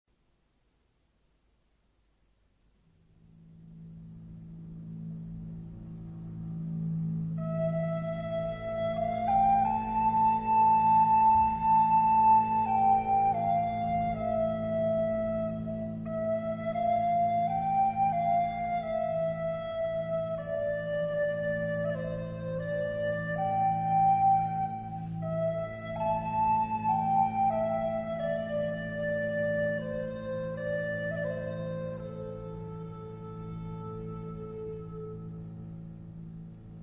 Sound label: Irish Lullaby